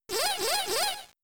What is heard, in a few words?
Charm; Pokemon; Gen 3; attack moves; RSE